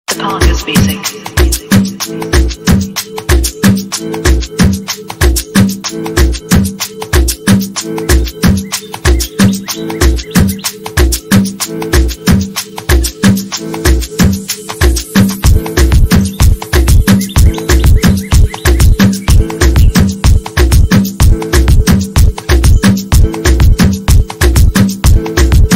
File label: azeri bass music